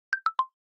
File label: Major C, notifications; Gome; U7